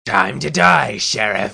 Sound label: die; wanted